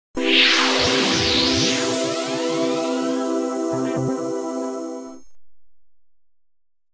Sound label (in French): jingle pub